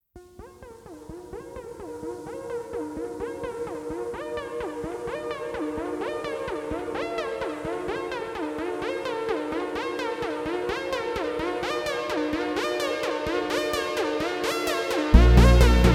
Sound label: Futuristic Sci-fi Arpeggio